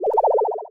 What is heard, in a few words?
teleport